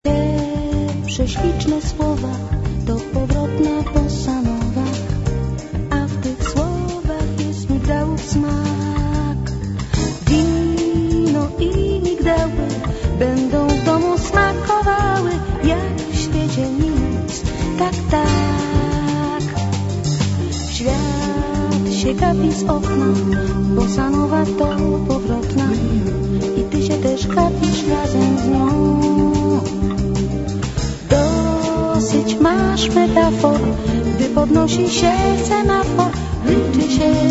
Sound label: bossa nova